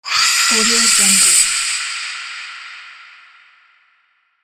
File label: scream